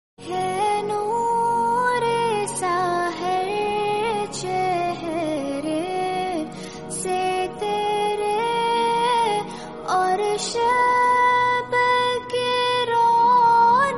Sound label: arabic naat